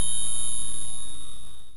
tinkle

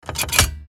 lever; slots; gangsterallstar

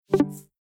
widget Closed

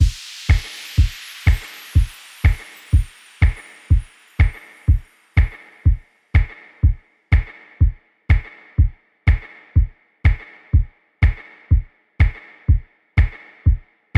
DRUM

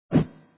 a Swipe

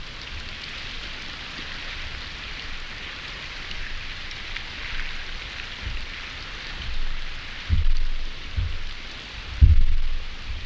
loop rain

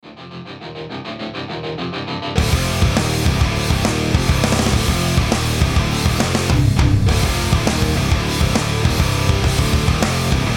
powerful hard rock logo

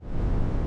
jetfeet

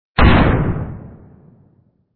explosion; bubblespinner; html5 games

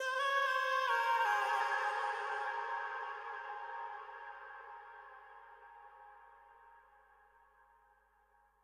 FX, viral hiphop

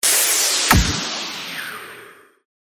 windfall Start

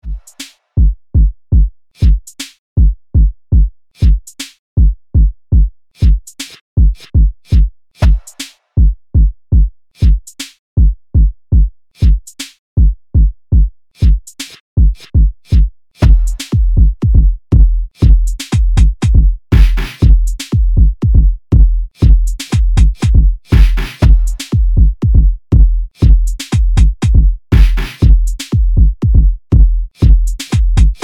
arp

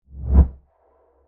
planet zoom in

